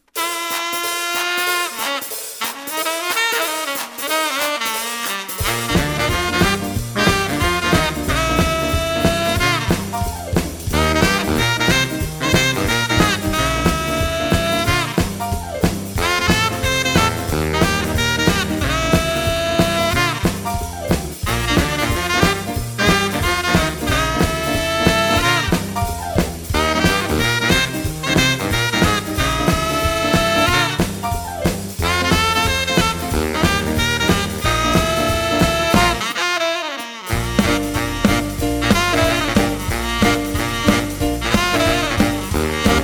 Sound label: Blue Jean Shuffle